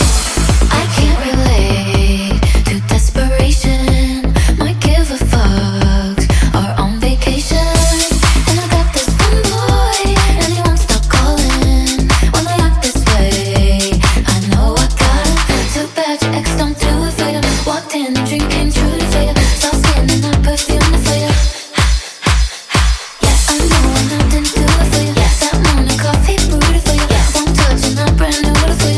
Tech House 124bpm

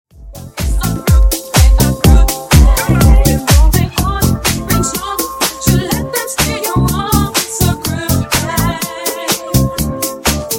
Funk Booty Mix Clean 122Bpm

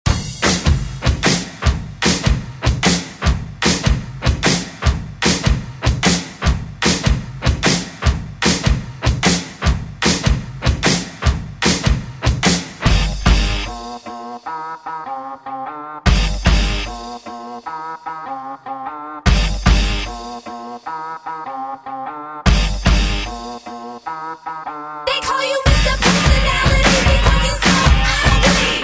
90s ReDrum; DJ Mhark

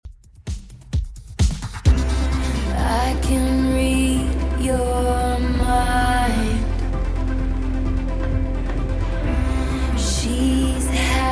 Top40 130bpm DIRTY; Redrum

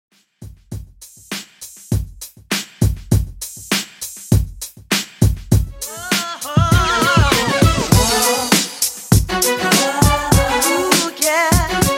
Workout 100 Bpm; Redrum

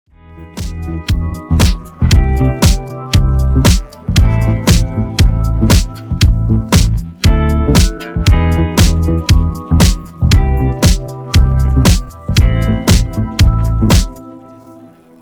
Extended 117 Bpm

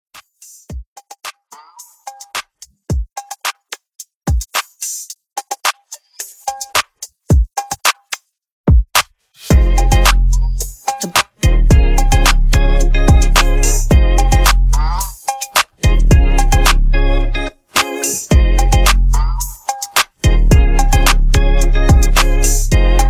Club, 109; Club Edit

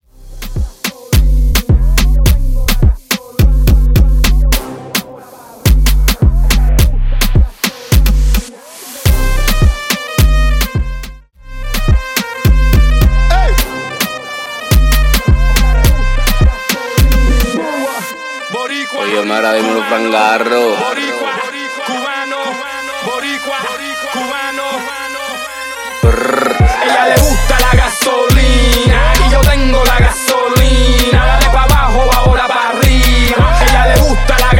Moombahton Remix 106bpm; Fran Garro; remixplanet

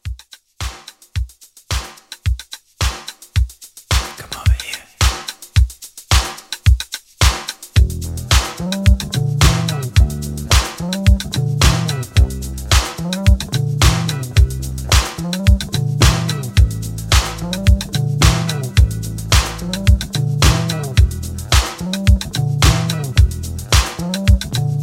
70s Rock Re Drum 109

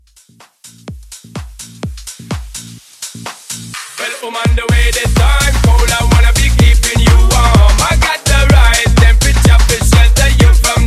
Vocal Mash

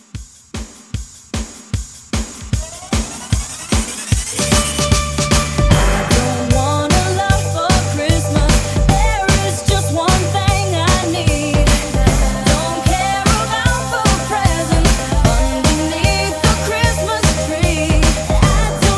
Re Drum 151

